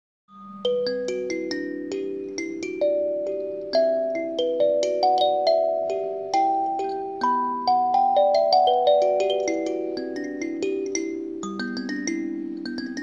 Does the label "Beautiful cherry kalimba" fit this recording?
Yes